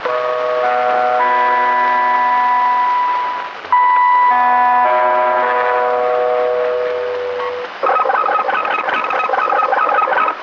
Radio Call Sign; SFX

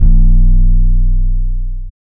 808, Bass

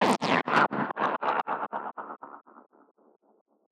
Shiver; SFX; Ambient - General

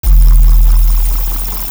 Bass Noise; 808

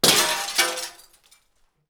Glass panel breaking; SFX; IRL Recorded

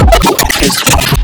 Audio Corruption, Electronic

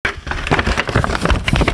Paper; SFX; IRL Recorded

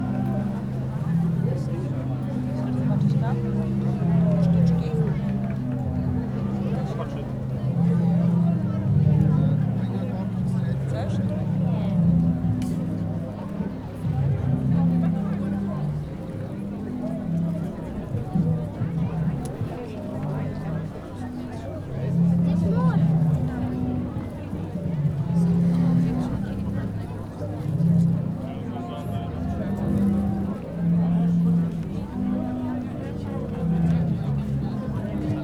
Zadar Sea Organs; SFX; IRL Recorded

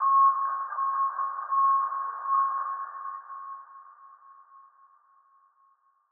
Truck, Beeps; SFX

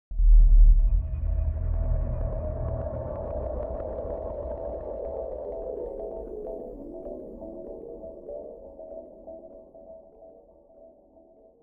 Big Room, Ambient; SFX; Ambient - General

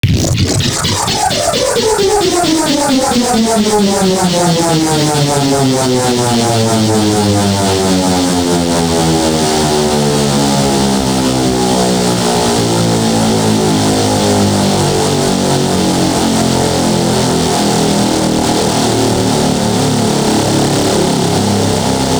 Reverb Tail; SFX; Experimental - Other

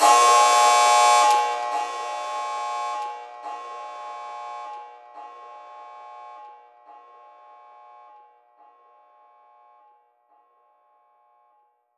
Alert, Beeps; SFX

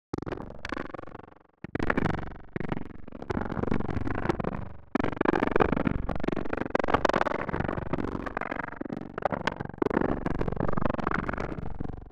Popping, Experimental; SFX; Experimental - Other

